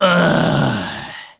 ugh; terumi

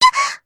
moan

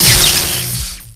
charge; gunvolt

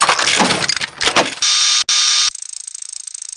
examine

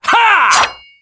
heavyslash

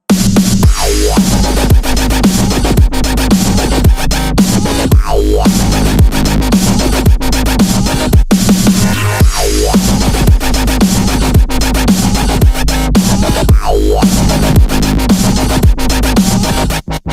wubs

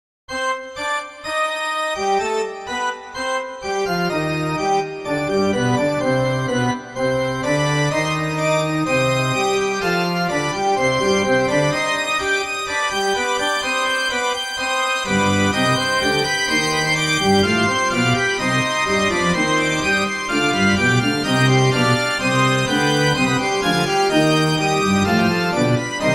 Organ Short

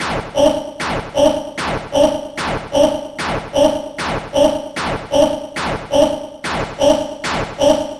spade shot; jevil

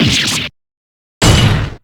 stab

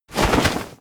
clothes; bullet

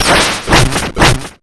shovel jump

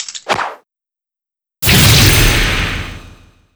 break; Hazama

